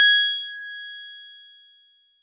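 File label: Easy Clav DX100 G#1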